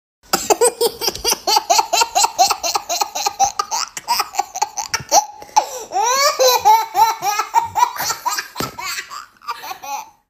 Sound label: Baby Funny Laugh; Comedy sound effect